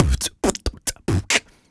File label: Human Beat Box, 140; Loopmasters